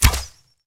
arbalest shot; 12zi snake boss

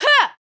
combo, onehand sword; warrior

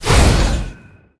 spell fast blow; archer

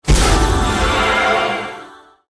spell holy storm; fighter

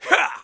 warrior voice soft

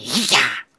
small attack

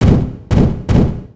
dead walk, spider king, monster

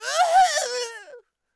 barbarian infantry dead; monster

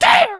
small die, voice

